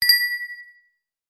multimedia alert notification basic bell synth positive chime ring